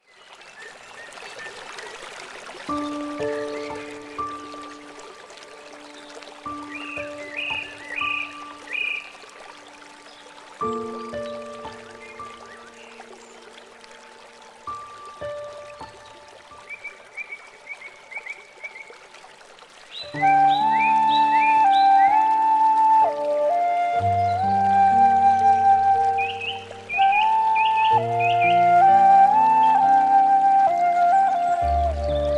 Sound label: Relaxing nature